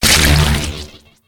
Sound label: Eyeball Squish; Ruins